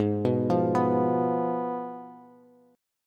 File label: Ab Minor 6th, Ab, Bass; Abm6 arpeggiated; JGuitar